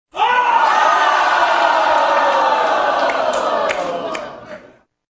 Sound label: Shocked